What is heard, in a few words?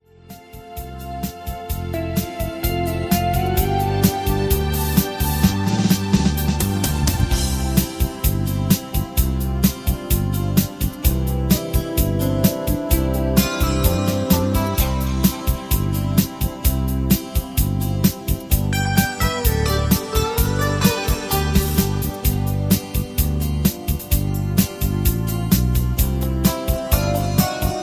High Key No Leads; Singing Calls